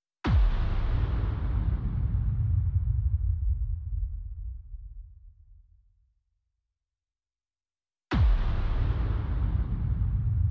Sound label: Percussion Only